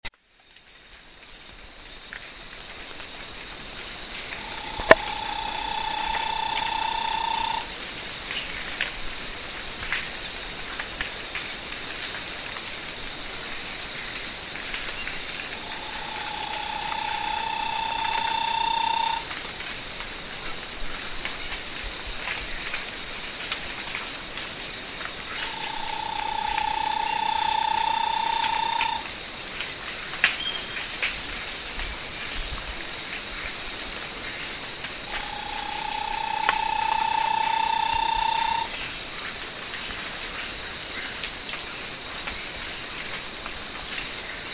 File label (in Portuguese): canto do macuquinho baiano